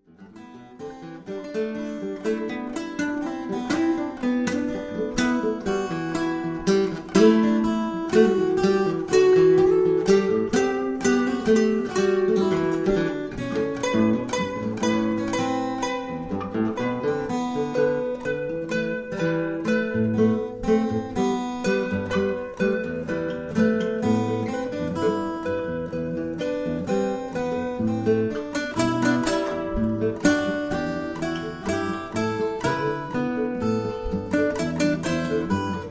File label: Trio Impro